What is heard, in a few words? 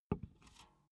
big can filled put down soft